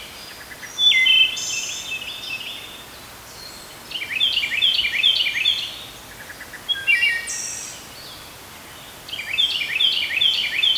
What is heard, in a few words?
Wood Thrush Hylocichla mustelina; thrushes thrashers